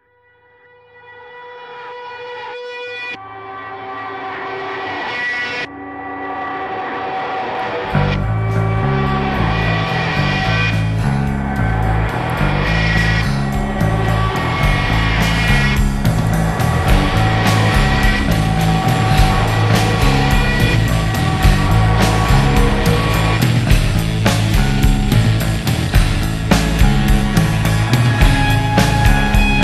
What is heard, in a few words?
wave down